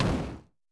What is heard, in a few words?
hit; khanat; sfx; pow